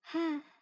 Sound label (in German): seufz; lea